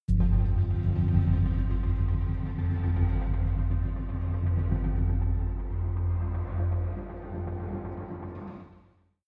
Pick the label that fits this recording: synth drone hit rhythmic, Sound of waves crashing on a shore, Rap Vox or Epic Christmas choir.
synth drone hit rhythmic